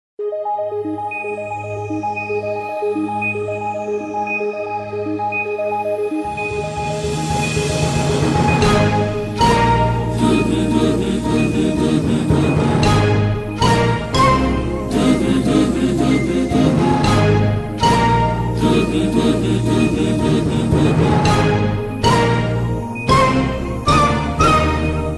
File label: Rabindra Instruement